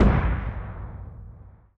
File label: percussion, Roland TD-20